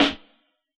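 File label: abstract kit snare; Dr 202 Kit 1; Percussion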